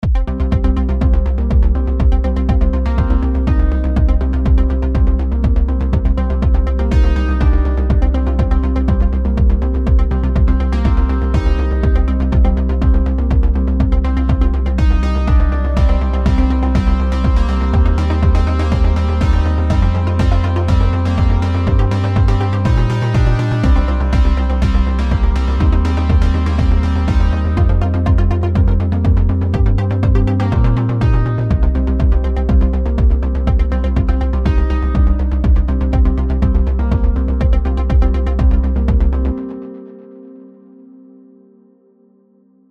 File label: Mini Brute Short Song